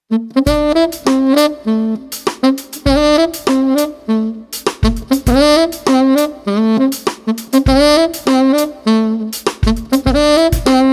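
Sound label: Street Sax Trumpet Smooth Drums, 100 bpm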